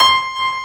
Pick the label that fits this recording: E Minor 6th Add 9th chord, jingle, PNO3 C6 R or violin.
PNO3 C6 R